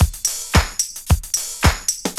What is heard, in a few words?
110 FLOOR, loops; AMG; Now CD-ROM (Roland)